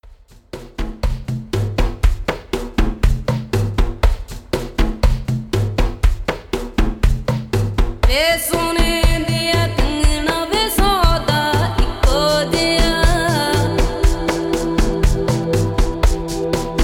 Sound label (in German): Viele Klänge eine Stimme, Schamanische Meditation; Entspannungszeit; Wellness CD